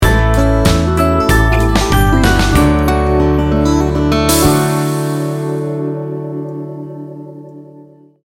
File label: americana acoustic folk sting bumper